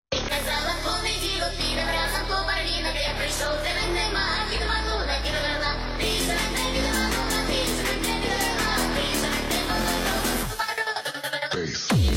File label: remix techno